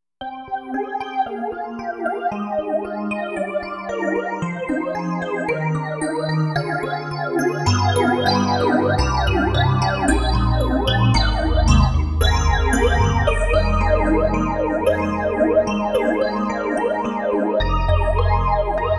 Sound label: EDIROL SD-90, Enhanced, Chorus EP, Phasing EP